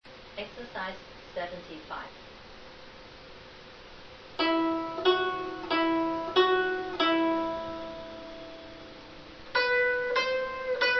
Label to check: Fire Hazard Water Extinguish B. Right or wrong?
Wrong